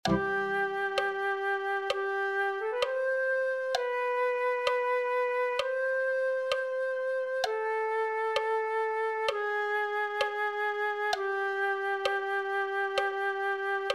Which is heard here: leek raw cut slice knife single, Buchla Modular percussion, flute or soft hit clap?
flute